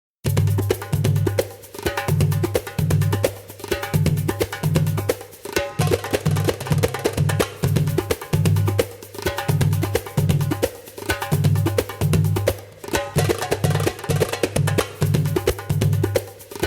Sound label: music level undersea Beach